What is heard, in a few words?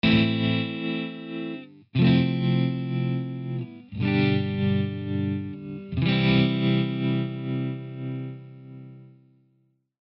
Tap tremolo bias strat twin; simulanalog; line6; tonecore